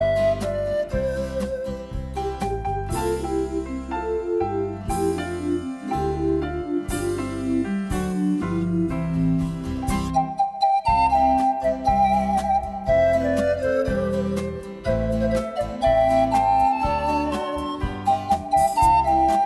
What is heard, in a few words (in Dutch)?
instrumentaal